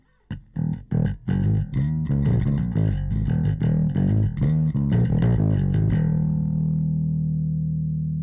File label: bass; preset E Bass